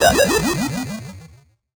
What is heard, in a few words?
8Bit Fx; Antidote Advent